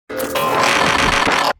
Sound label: Spawn Sound; labubu; mecha